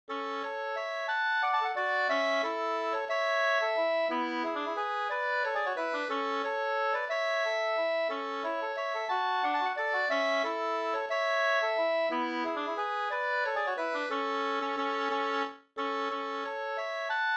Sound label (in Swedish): vals A dur